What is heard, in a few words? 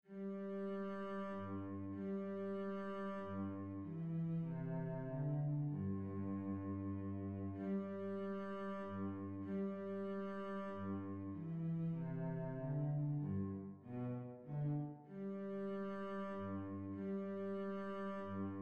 menuet sol